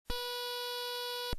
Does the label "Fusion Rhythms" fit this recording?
No